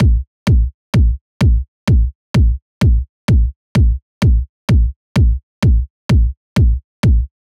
Drum Kick

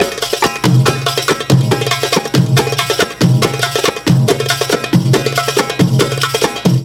Drum Percu Break

